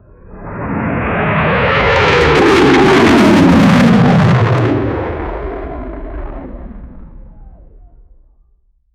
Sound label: fighter jet deploy counte